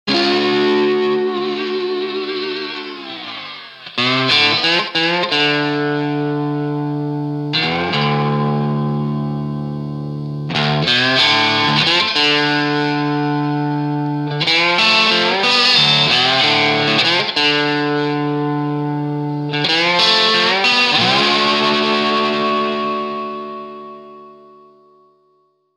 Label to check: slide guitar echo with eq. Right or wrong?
Right